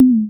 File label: Perc Hit, Noise